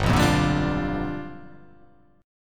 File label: G 7th Sharp 9th G chord